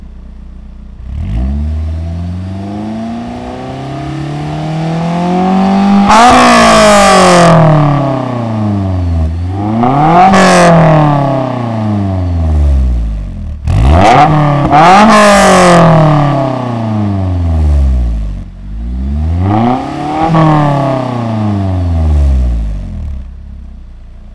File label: rev; s2000; s2000 modifications parts